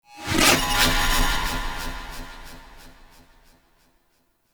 Sentient Drop Spawn; Sentient Movement

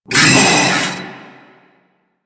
Vocal Pain; Warframe; The New War; Archon; Nira

